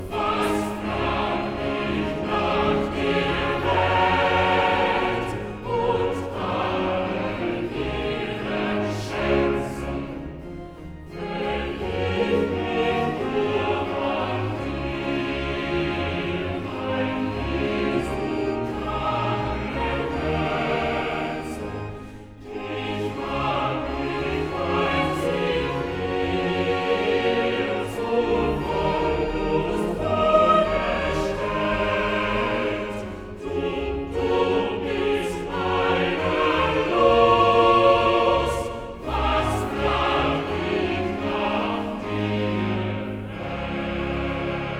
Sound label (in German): Choral; Cantata, BWV 64 'Sehet, welch eine Liebe hat uns der Vater erzeiget'; Bach; New York; 3rd Day of Xmas